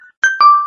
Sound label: Realme SMS; GT5